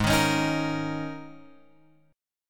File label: G sharp Suspended 2nd Suspended 4th, chord; G#sus2sus4; {4 6 X 6 4 6} strummed